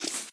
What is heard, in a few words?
rabbit; smite; medusa